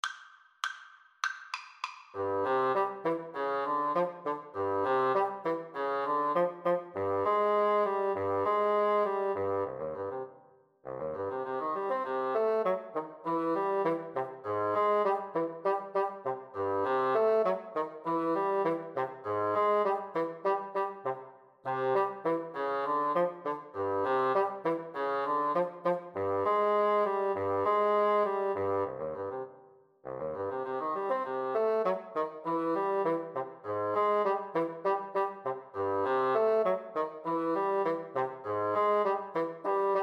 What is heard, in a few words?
clarinet bassoon duet